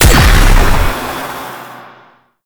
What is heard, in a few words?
Stun Burst; Warframe; Stalker